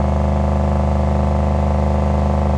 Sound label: Z28 Loop, Automobiles